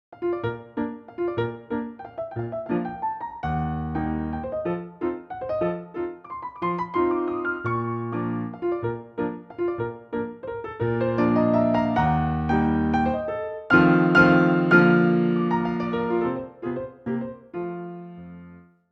CLASSICAL SCHUBERT; Nokia

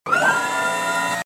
front camera mechanical up; Allview Soul-X6-Xtreme

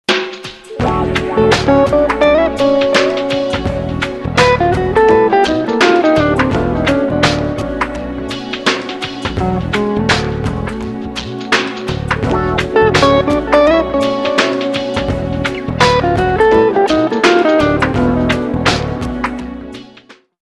jazzy; Motorola; W360

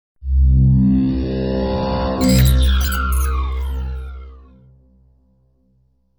Electricity clock alert; Nokia; audio themes

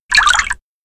Water ripple, notifications; ZTE Nubia Z5

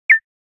Chat, Nokia Alerts; Windows Phone apps

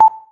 Video Record, ui; BlackBerry Priv

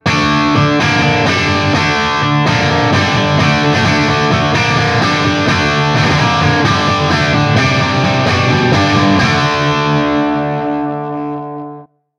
Rock, ringtones; Marshall; London KB 1501

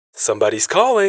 Somebodys Calling; Alcatel Elle GlamPhone N1

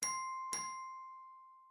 Jingle, notifications; Infinix; XOS 16

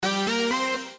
SOUND NEW MESSAGE, phonetones

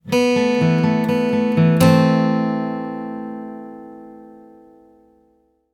Acoustic

